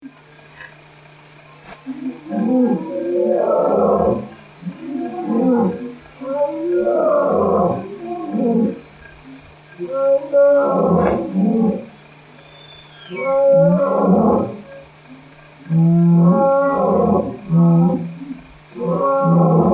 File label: Lion Roars, africa; africa2001